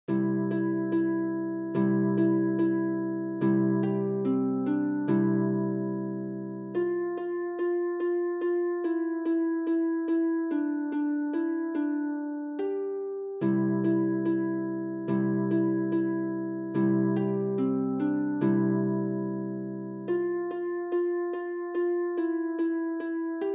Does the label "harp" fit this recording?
Yes